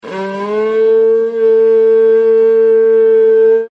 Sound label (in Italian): corno di bue, suono corno di bue; segnale di pericolo usato dai Lunda; segnale acustico prodotto da un corno di bue